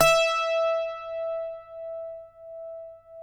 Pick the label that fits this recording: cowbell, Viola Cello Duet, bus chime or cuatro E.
cuatro E